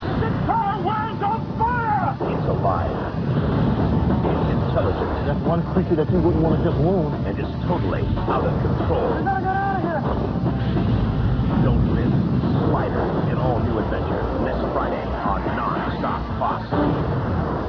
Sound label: fire